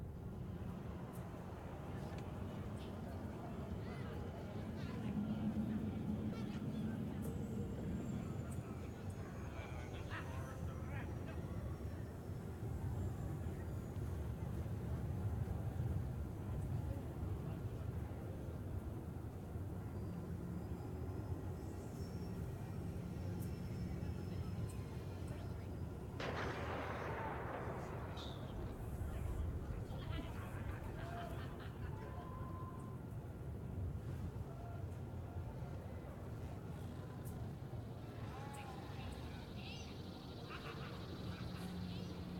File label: amb city; ambiance; environment track; tatooine